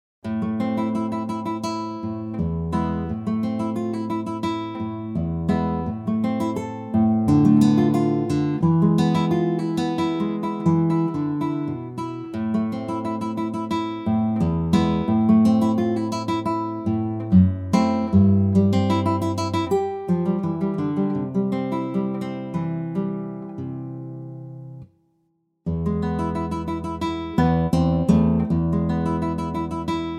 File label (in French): guitare etude moderato